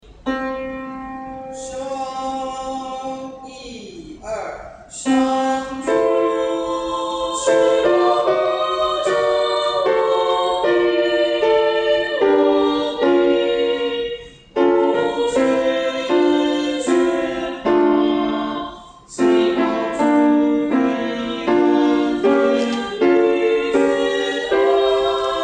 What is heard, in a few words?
tenor